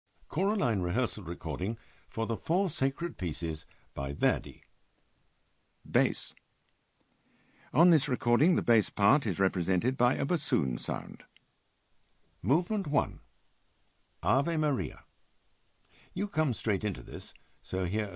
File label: Bass; Verdi 4 Sacred Pcs